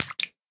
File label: flop, guardian; mob; Minecraft